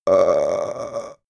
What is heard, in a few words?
male die growl weak; koerpergeraeusche; menschen; soundpool